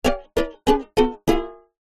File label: cartoon descend steps strings